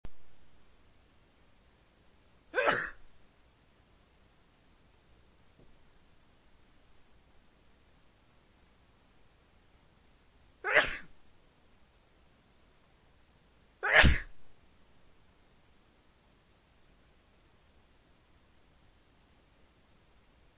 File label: cold, male